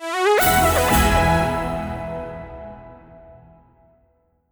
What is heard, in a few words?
Win Medium; netent